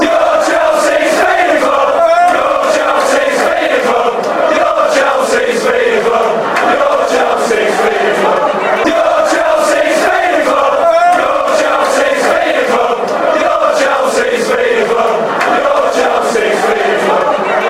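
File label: youre chelseas feeder club, football chant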